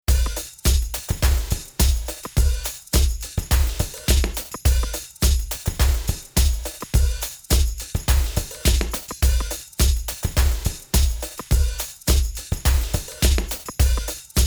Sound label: Beat neutral